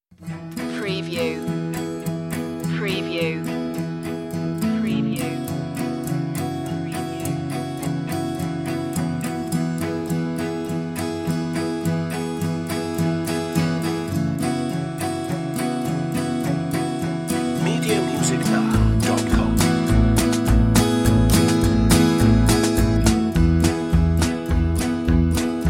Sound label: no strings no piano